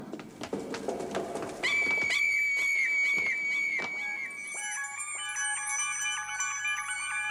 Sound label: scream; Sapphire and Steel; the scream melds into the music of the opening titles